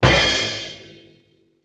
Bass Drum Cymbal Hit; Vintage Cartoon; Drums & Percussion

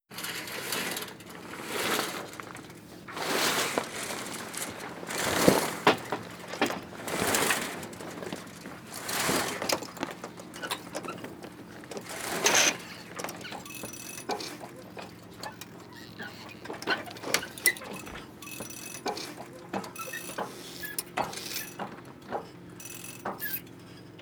Boat Sail Hoist; Vehicles; Watercraft

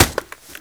Football Helmet Hit

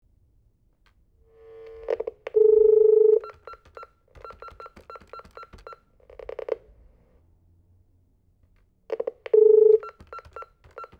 Telephone Reciver

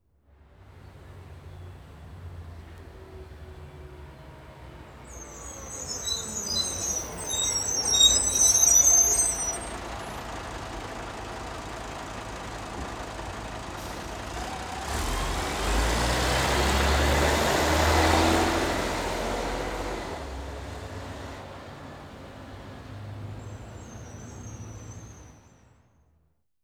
Bus Stop

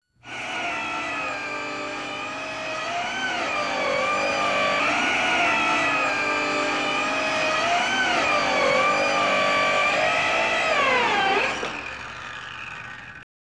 Power Saw

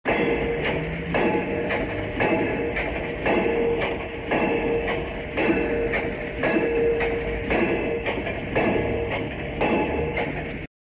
Pile Driver

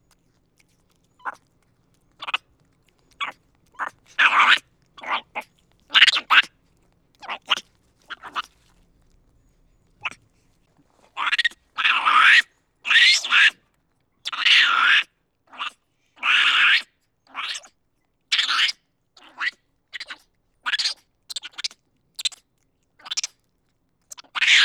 Monkey Chirps Snarls

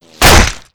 Bullet Body Hit With Blood; Weapons & Explosions

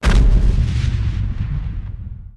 Explosion Large Deep; Weapons & Explosions

